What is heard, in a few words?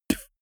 beatbox bass drum dull damped